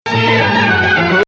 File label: Nawa Athar Gameel Rast; In a sense, this isn't Rast 1, but Rast 4 underneath the Bayati